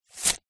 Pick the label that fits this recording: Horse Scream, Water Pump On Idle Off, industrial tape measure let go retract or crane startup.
industrial tape measure let go retract